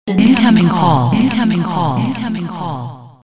Incoming Call

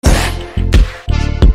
Rargh

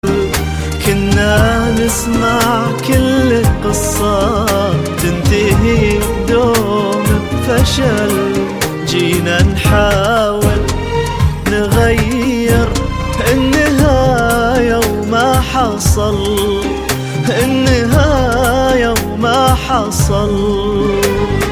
Khaliji

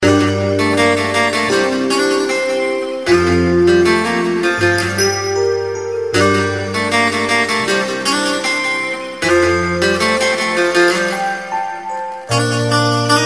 music; Mahobbaten

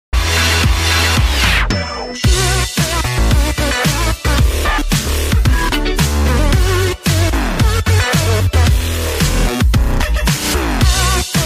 Dubstep

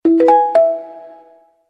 Xylophone

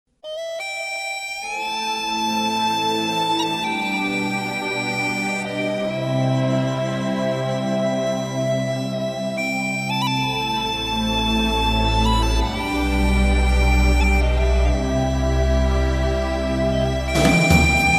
Classical

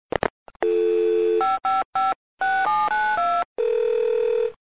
Calling

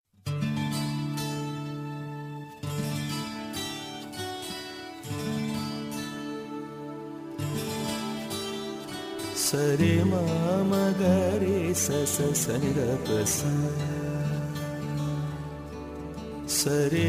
Melody Guitar

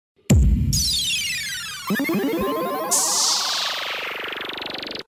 3d Boom

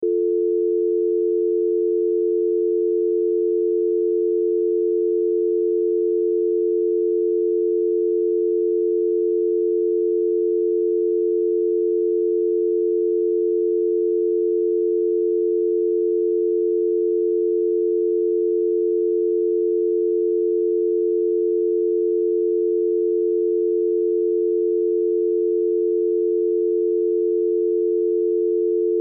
Dial Tone

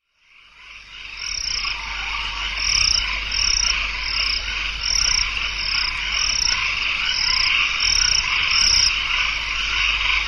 Charming Crickets

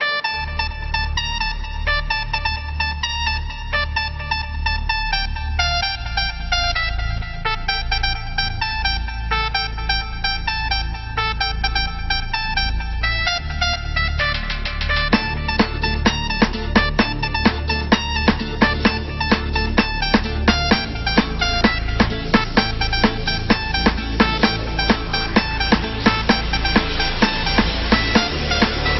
Classical

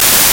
Singing

